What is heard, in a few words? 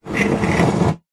household computer stand metal slide on wooden desk; zapsplat